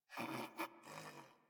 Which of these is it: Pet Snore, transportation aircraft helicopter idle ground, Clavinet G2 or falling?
Pet Snore